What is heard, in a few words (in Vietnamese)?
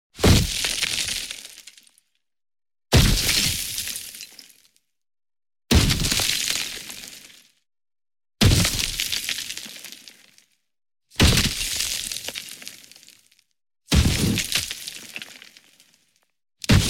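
Tiếng bắn đạn vào tường đổ nát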